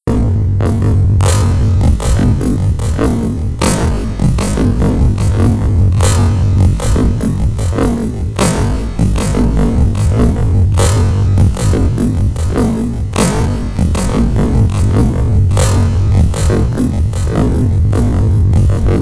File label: rhythm; loopzone2